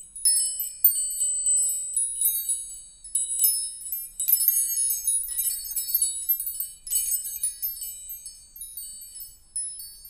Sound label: wind chimes